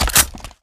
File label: rifle clip locked; br85